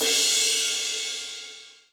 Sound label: Crash Large 125bpm; Battlefield Hardline; ps3 ps4 windows xbox 360 xbox one gamerip 2015